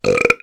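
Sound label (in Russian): моя собственная чистая и забавная отрыжка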